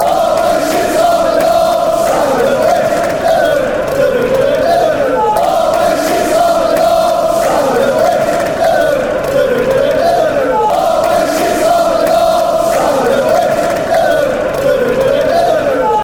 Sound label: fanchants; always sit north side bridge grims